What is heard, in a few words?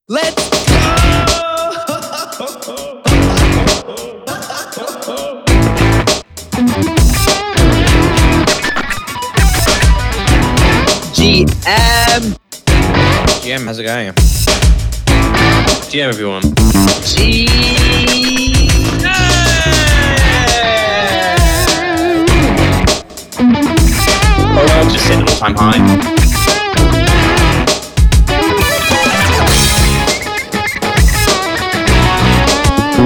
with Voices